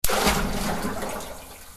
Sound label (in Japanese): mizu; easyrpg; Ib